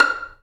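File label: Vio pizzicato F5; Digital Sound Factory Virtuoso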